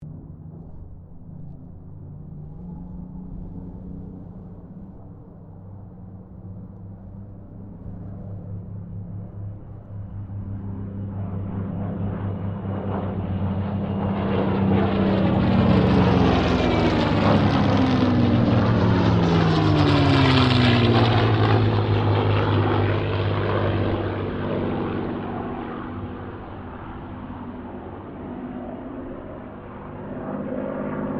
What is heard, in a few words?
Spitfire Mustang Kittyhawk pass; Mixed aircraft squadrons; Airplanes; Vehicles